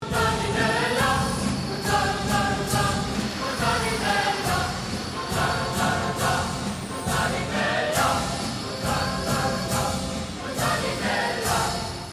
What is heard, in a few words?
opera classical